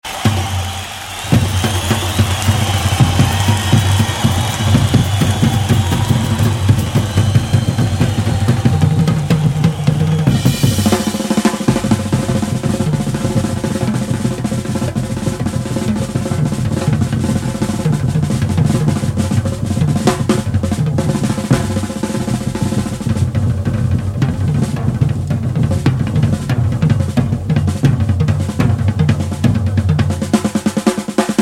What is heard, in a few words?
drum solo